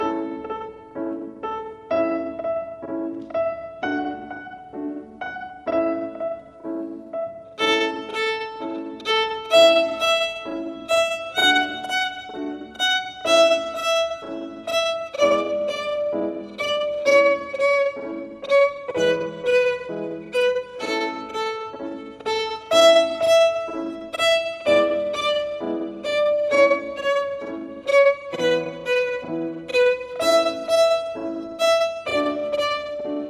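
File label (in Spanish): Twinkle Ritmo B Lento, violin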